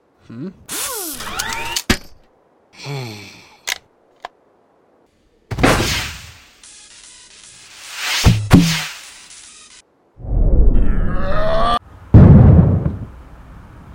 Rocket; The Henry Stickmin Collection

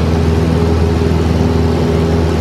Plane Engine; gamerip; Mars Sortie

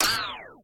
Obstacle Tv Off; In Obstacle Tv Off

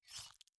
gore dash; Ion Shift

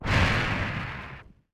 Monster Explode; battle suit aces

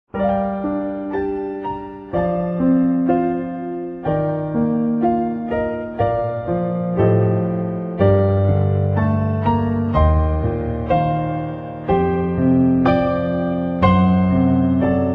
Saddest Piano Song; menherafflesia flowering abyss; android

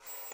Ui Camera-Sounds; Sx Ui Camera-Sounds

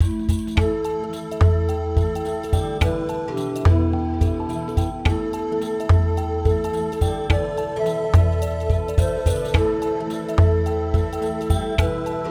Sad Forest Drum; Klonoa Door to Phantomile prototype; PS1

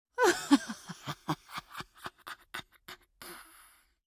AMB China 3D Amb

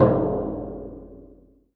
wind blocker

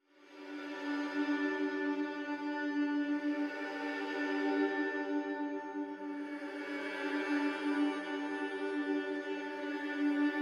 Musica loop; FinalCutScene; Santuário quebrado 1 longer version

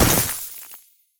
Impact Ice

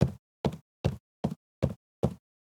Fat Sentry Walk Loop; Hollow Knight; Ruin Fat Sentry Walk Loop

